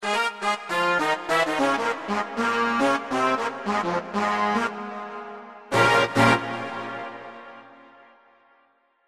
BRT BRASS EXS

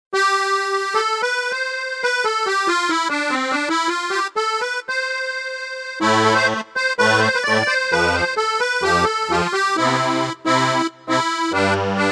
Ketron Musette

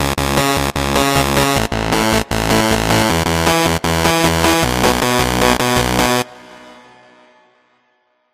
GRIT BASS

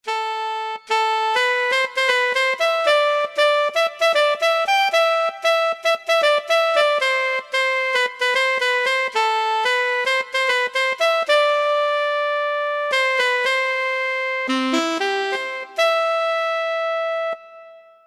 Alto Triton

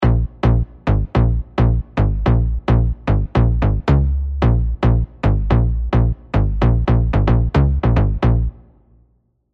BASSES ANALOGUE BOUNCE

